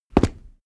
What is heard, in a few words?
step stone walk